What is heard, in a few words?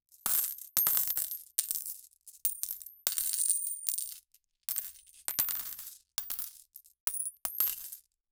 a bundle of money; gold coins